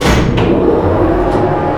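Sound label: elev run start, elevator run start; Call of Duty Modern Warfare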